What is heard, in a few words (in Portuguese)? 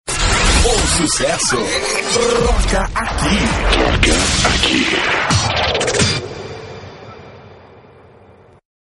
vinheta